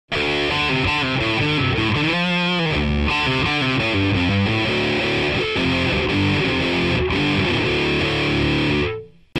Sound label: C1 - Gain (Bridge)